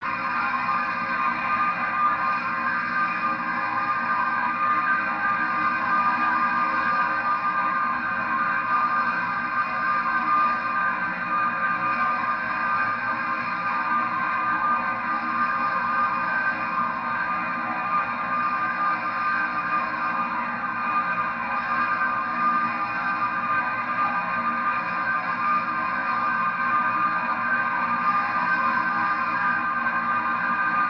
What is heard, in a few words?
Ambience Tonal Gas; drones & tones